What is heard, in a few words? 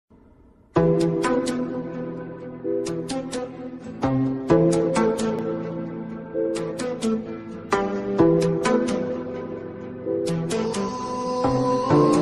Arabic remix; tiktok viral song remix music